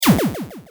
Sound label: portal; mega runner